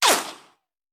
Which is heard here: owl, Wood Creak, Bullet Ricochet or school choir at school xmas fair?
Bullet Ricochet